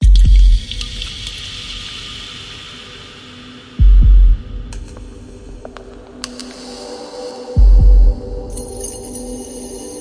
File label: epic kick intro